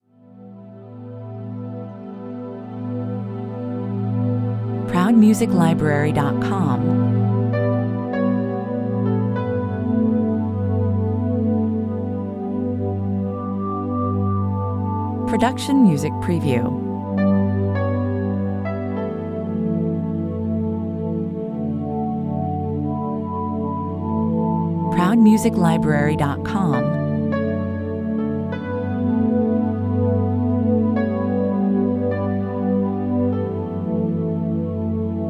A calm peaceful soundscape that is useful for relaxation, meditation, spas, nature footag, 52 bpm; scenes depicting a contemplative passage of time